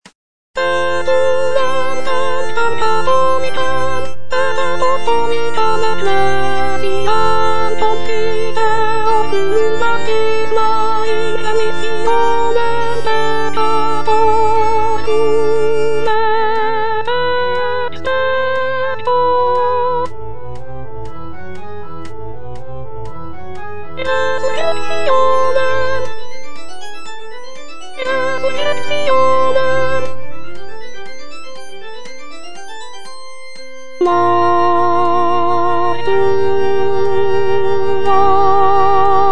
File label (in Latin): SOP Et Unam Sanctam